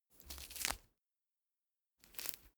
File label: mandarin peel single